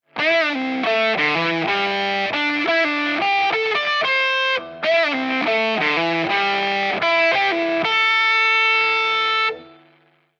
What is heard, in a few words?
solo modern, ampsims; tse r47